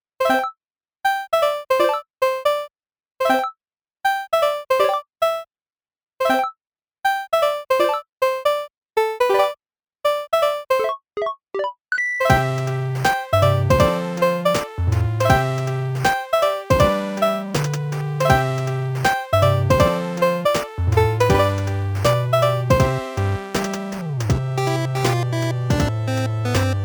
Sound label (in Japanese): 8bit Chiptune ver ～レトロかわいい作業用配信用 Kawaii～; にゃるぱかBGM工房